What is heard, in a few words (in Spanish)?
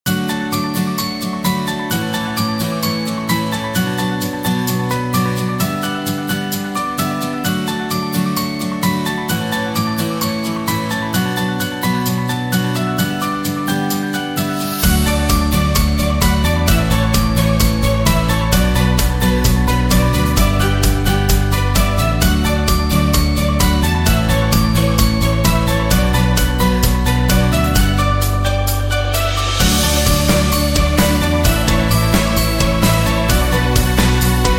musica corporativa verano